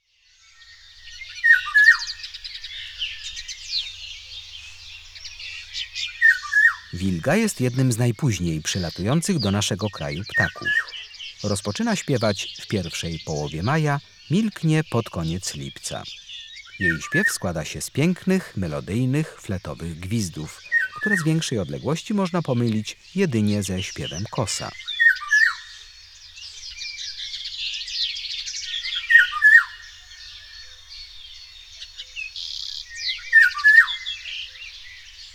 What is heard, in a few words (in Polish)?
Wilga, Ptaki; Kurs PTTK